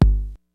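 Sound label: 909 House; Rubber Chicken Software Sample Bank; DRUMS + PERC; OTHER DRUMS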